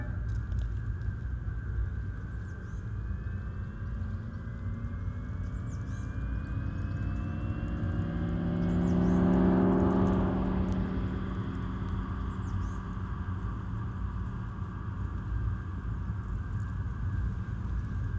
Real AVAS Directivity, electric vehicle

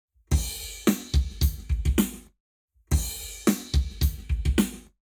Lab Wet Drums 0 to 360deg

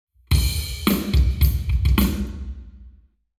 Hall, Drums 0deg

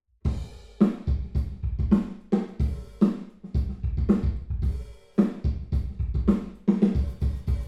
drums, binaural; em32 Aalto

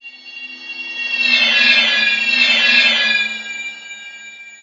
eight wheels all node

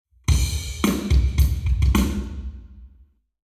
Hall, Drums 0deg; eBreve

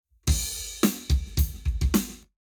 Lab Wet Drums 0deg

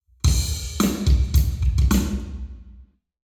Hall Drums 0deg; eMagLS

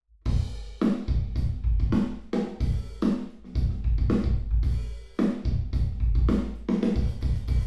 drums, binaural; em32 Aalto

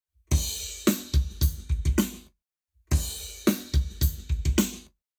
Drums, 0to360deg; eBreve; Lab Wet

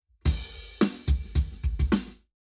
Lab Wet Drums 90deg; eMagLS

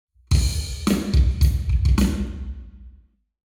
Hall, Drums 0deg; eMagLS

